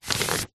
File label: foley paper sheet a4 run through hand; zapsplat